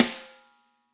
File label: Snare Crack